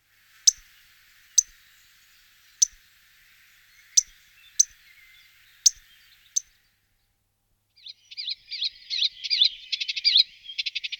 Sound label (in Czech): Sedmihlasek svitorivy Hippolais polyglotta, nasiptaci